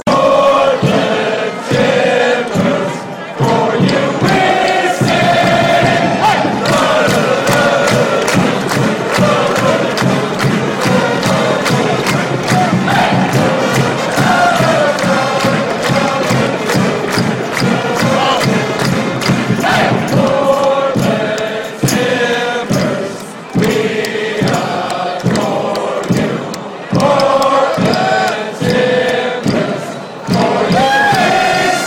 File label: tetris korobushka chant